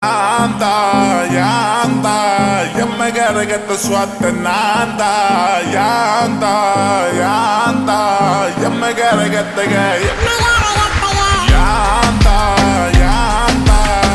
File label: Slow Reverb Version Ringtone